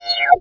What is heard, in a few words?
monitor off; Emperor - Battle for Dune